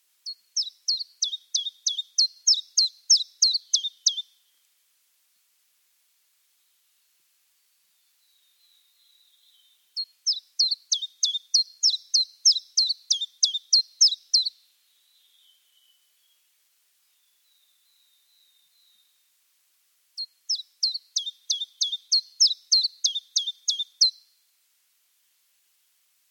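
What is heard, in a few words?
common chiffchaff voice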